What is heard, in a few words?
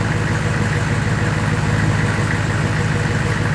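truck idle loop; ambient; machines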